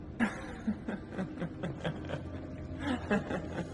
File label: Gruesome Giggling